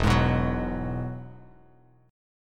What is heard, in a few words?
E Major Add 9th, E, Bass Standard; strummed; JGuitar